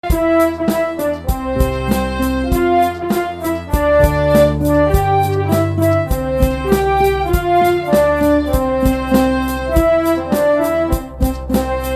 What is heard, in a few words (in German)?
Lied instrumental; Rumpelstilzchen